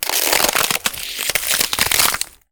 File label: ice; slice fruit